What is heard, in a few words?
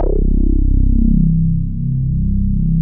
Dance Floor Bass, Multisample Basses